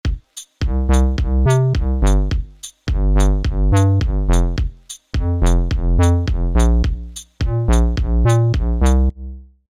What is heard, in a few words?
Bass No delay